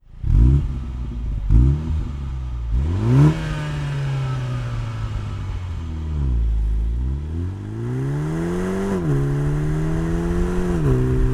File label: Mini Cooper S Clubman Axle Back; REMUS